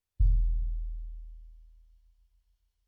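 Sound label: BD In, bass drum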